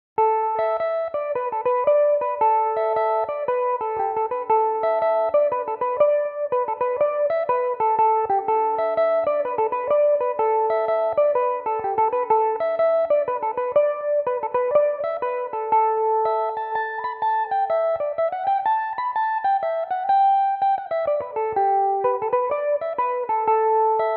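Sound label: slide A dorian, Irish mandolin; O'Keeffe's Slide; Belmuse EM-190 electric mandolin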